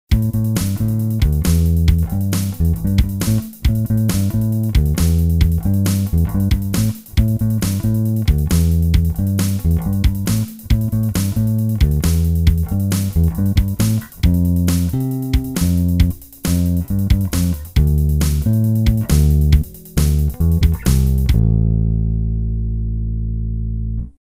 Neck Pickup full Bridge Pickup; Harley Benton JB-62CC; 10.00 Uhr Stellung

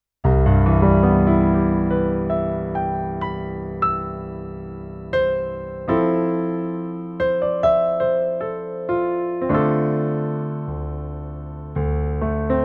Roland Go Keys Concert Piano

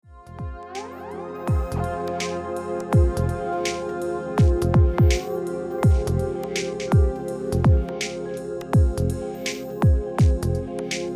Warm Vibes Dry

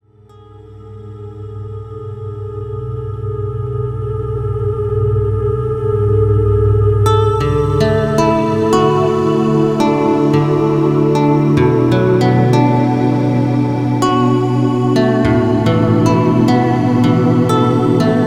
Pad single med rel

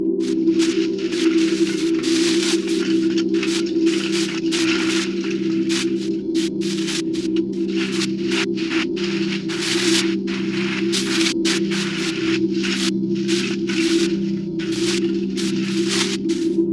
Noises Seashell Dust; AudioThing Hainbach Bundle

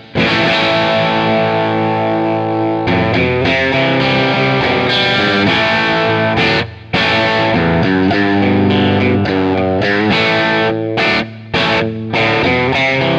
Paul Reed Smith Bridge PU Humbucker Split Breakup; Thomann 70th

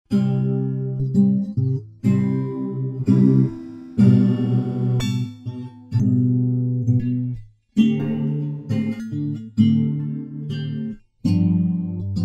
Refract Noise

